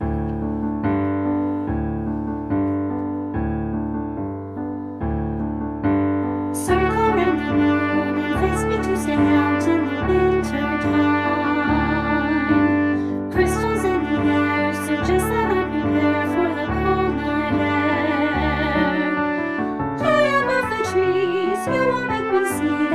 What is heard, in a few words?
Circle Round the Moon with Vocal